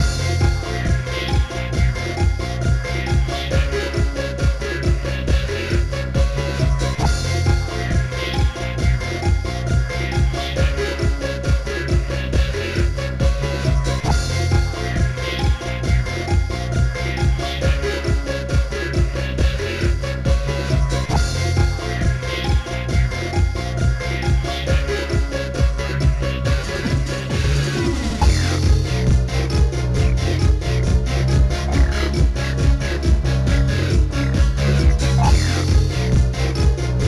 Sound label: Beat Wave; RivalBall for Macintosh